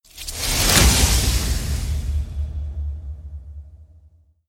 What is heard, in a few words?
hit shred; toxin